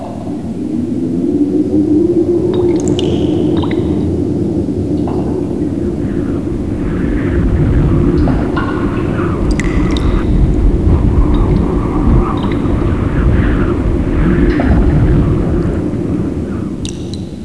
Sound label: cavey